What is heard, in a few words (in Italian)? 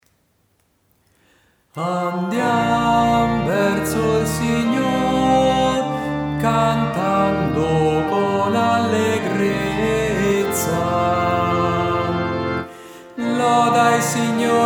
salmo 99 andiam verso il signor tenor